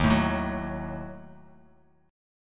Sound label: Eb Diminished, Bass Standard; Ebdim strummed; 11,12,7,11; JGuitar